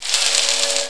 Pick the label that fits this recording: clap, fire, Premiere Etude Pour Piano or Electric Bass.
clap